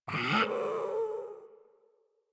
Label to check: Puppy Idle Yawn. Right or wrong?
Right